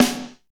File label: snare, R&B Snares, Roland